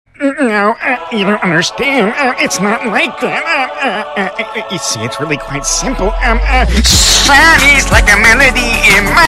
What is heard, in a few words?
sings; squilliam